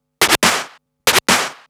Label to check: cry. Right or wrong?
Wrong